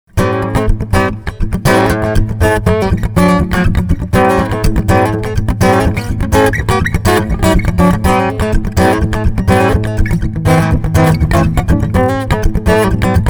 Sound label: funky lick